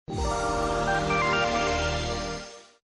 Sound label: Startup; LN510 Rumor Touch